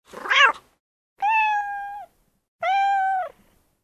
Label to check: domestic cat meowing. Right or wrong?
Right